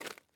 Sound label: ice step; teardown; ps5 windows xbox series xs